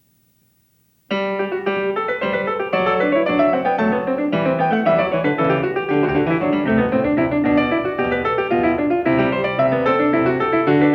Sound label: Variation 12 Canone alla Quarta, PIANISTS; BACH; GOLDBERG VARIATIONS